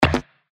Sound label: UI invalid sound